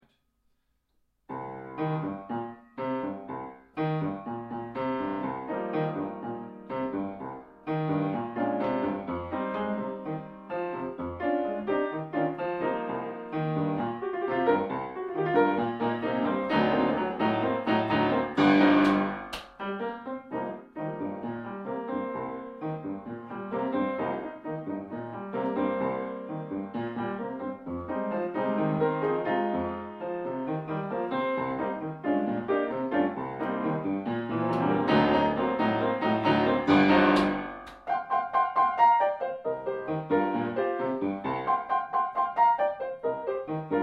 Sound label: Lowside Blues